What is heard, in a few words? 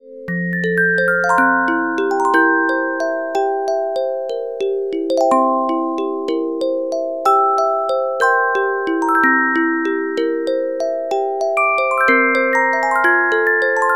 Carillon Music Box Melodies